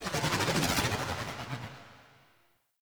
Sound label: Fairy Turn